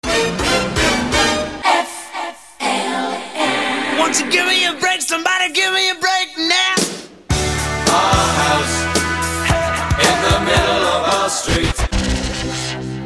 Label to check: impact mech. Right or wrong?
Wrong